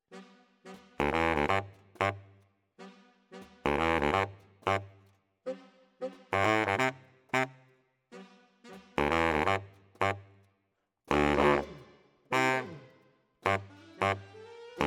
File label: Bari, RNR for piano